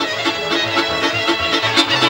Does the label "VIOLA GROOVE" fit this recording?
Yes